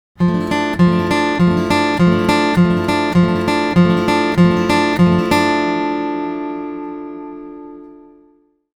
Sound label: fast roll; acustic